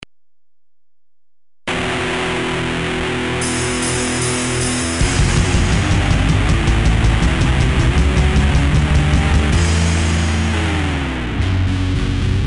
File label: punk metal